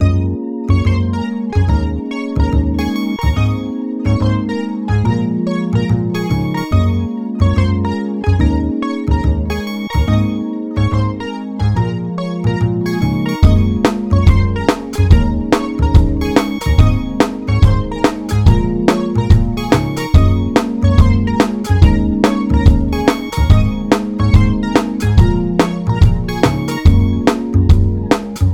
Emotional Rap Beat

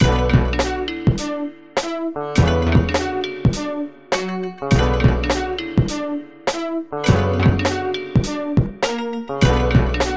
Dystopia Type Beat

